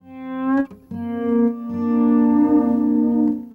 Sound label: Sarangi, Acoustic String